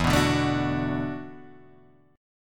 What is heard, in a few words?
E 11th Suspended 2nd